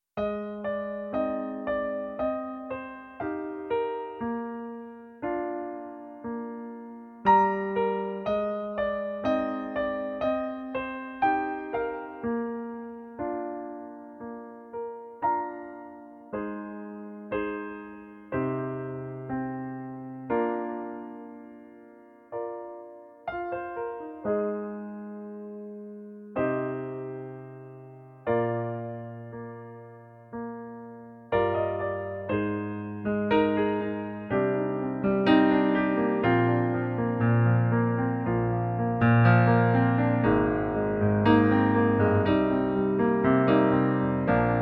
Pianoplayback in Eb-Dur

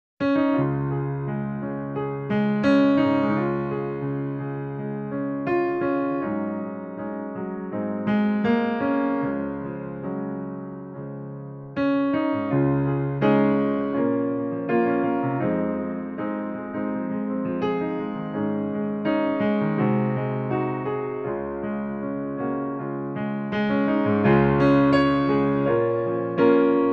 Pianoplayback sängerin C#-Dur

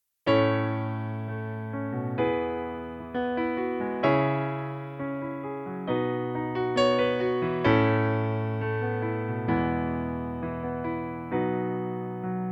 Pianoplayback E-Dur

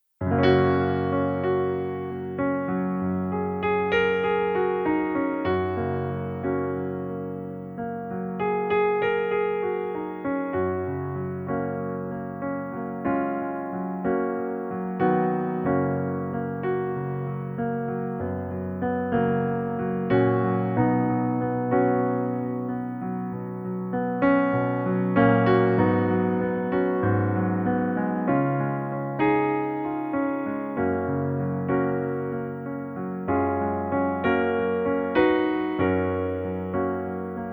Hochzeitsversion Pianoplayback in F#-Dur

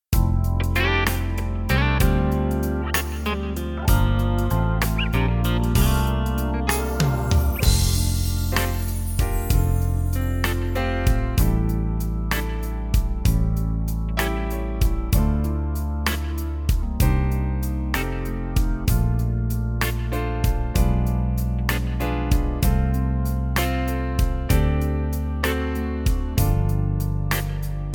vollinstrumentiertes Playback 6-8 soulballad F-Dur

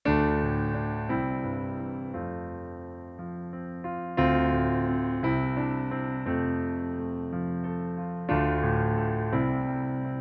Playback F-dur

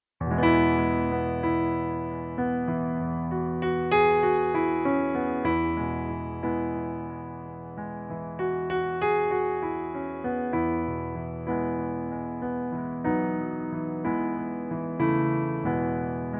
Hochzeitsversion Pianoplayback in E-Dur

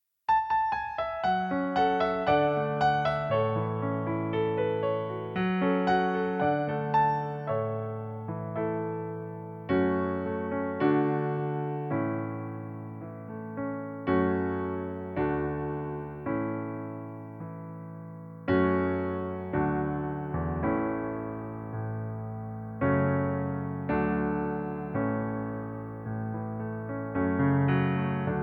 Pianoplayback A-Dur

